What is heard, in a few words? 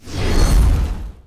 portal; monster